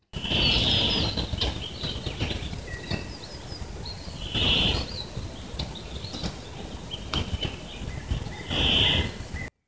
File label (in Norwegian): cicader